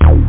hbass

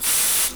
gas

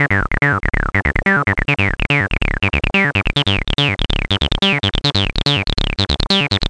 303 bassline; 303emu; new 303

